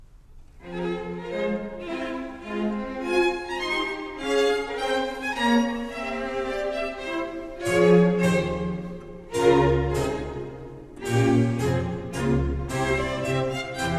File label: Menuet Haendel